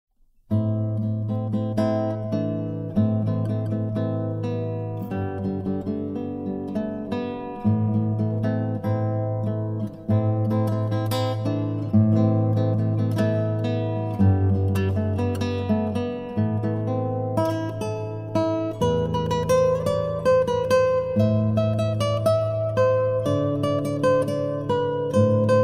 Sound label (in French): Chants du monde Guitare